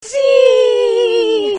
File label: Zzzz